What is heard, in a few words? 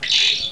splert lofi